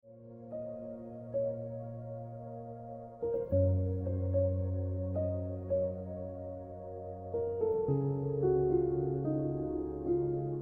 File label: Celestial Ringtone